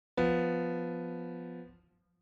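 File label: tritone F B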